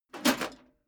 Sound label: metal plate armor movement single